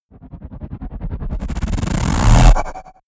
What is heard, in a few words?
whoosh stutter; swooshes-swishes